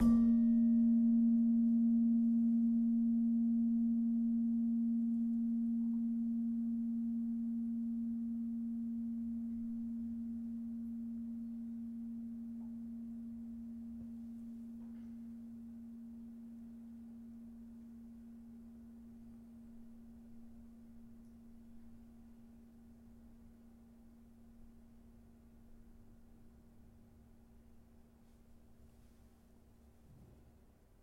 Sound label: Slentem, gamelan